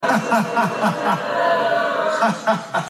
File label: creepy cackle; kasich; No Agenda